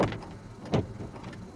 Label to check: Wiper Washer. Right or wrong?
Right